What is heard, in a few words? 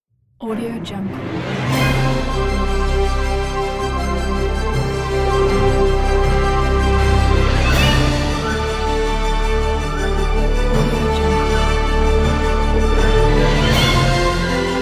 orchestral logo award ceremony opening